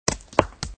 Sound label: stone break; Tempala